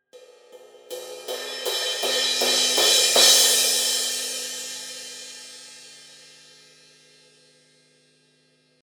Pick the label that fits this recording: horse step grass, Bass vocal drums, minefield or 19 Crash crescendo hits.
19 Crash crescendo hits